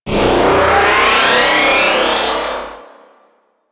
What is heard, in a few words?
Split Laser Open; Warframe; Corpus